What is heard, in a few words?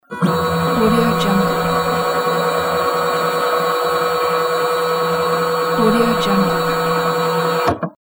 scanner scanning; wistansound